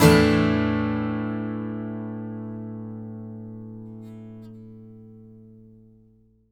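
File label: acoustic guitar A sus up, Whole Lotta Country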